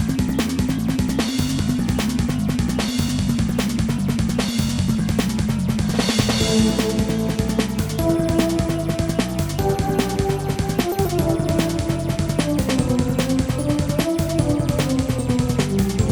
Bass; mega man 8 complete works ps1 saturn gamerip 2014

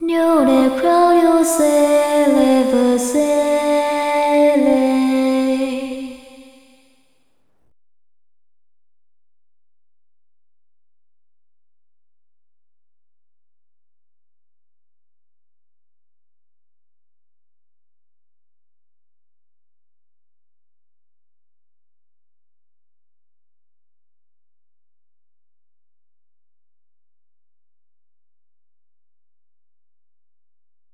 Hymn; tales of the abyss 2005 ps2 gamerip; Fonic Hymn

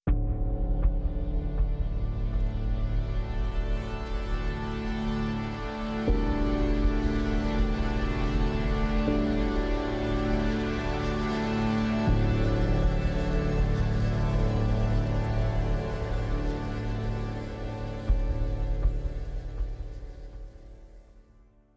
Monitor Music Climax; Stanley Parable

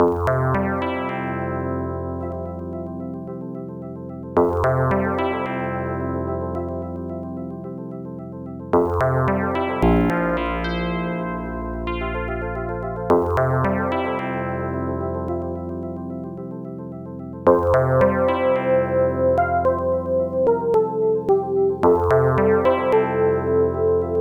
Sea Gulls, music synthesizer card demo songs; AdLib; IBM PC XT AT

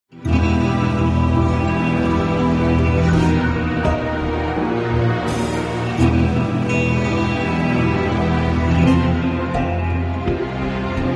Loop Tense

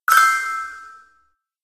Powerup Awarded; Angry Birds

